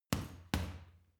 boxing punching bag uppercut double medium

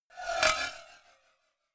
cowbell scratch hit clank hard

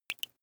dripping single

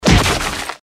fist hit seq

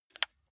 console controller joystick move single

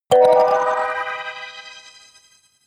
notification kalimba magical minor high

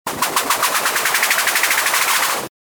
move fluttered noisy idling

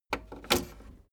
tape deck open slide

